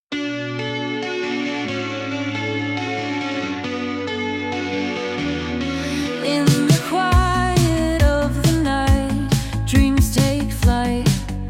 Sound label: Angelic Voices; silence dances